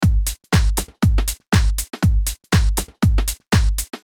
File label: Drum